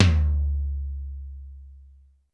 sfx perc

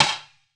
Rim; Zero-G - Future Wave; Drums; Xtra

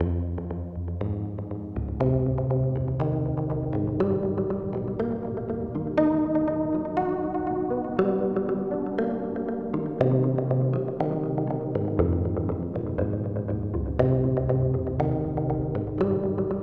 SNEAKY, AMBIENT ARPS; Zero G; Malice In Wonderland

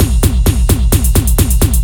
Beat, Drums & Percussion; Electrocution; Beats

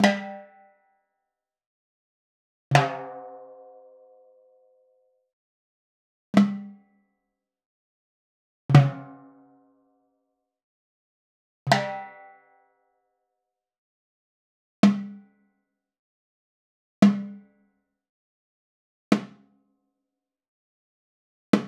Raw Perc Timbale; Sliced

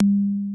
PER, TECHNO PERCS; Crypton - DrumBase5000

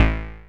OB VOX A2; Hollywood Edge - Giorgio Moroder Rare Synthesizer Collection; OB 4 VOX.

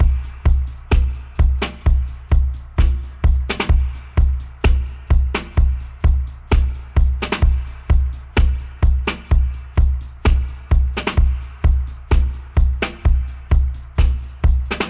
128 DUB, DRUM LOOPS 128 BPM; Drumdrops In Dub VOL-1

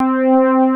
SOFTSYNT; East West - Global Sounds; SYNTHEX

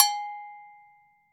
AGOGO BELL, Brazil; AKAI S6000 CD-ROM - Volume 5

